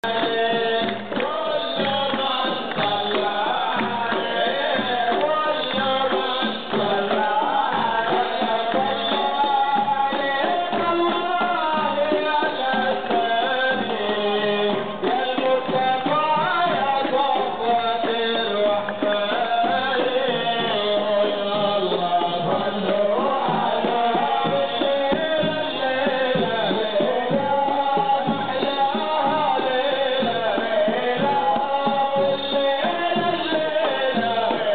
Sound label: wedding kingdom of saudi arabia